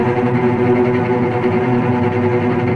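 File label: cello tremolo B2 mezzo forte left, string; East West; The Ultimate String Collection; VCS TREMOLO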